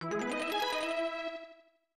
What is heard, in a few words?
Item Gained; Last Battle; SNES